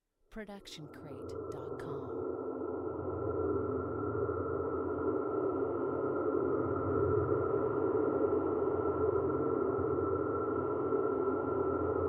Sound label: big rumble